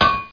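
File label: ground bounce; tnpdemo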